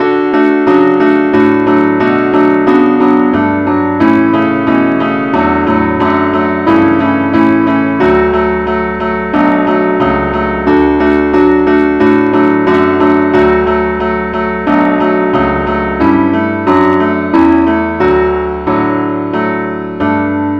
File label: keyboard only key D; Father, in Thy mysterious presence kneeling Fain would our souls feel all Thy kindling lov